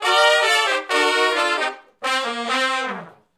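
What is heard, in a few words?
R&B Riff (C) harmony, Horncraft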